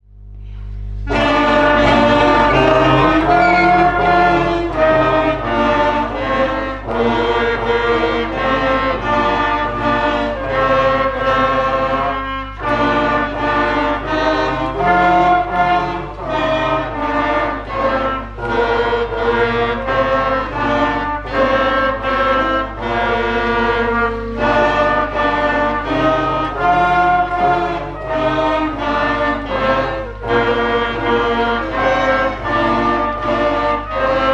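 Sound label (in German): Bläser Freude schöner Götterfunken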